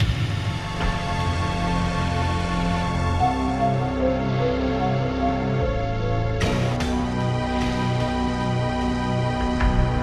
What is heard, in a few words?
tune